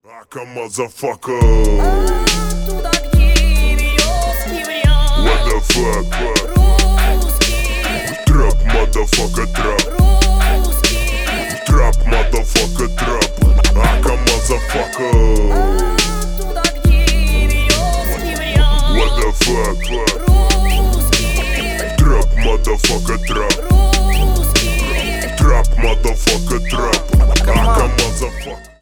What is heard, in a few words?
Русский TRAP